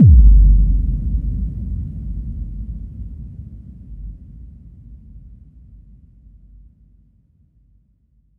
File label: FX Impact Simp